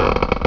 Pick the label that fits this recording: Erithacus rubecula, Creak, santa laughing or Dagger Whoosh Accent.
Creak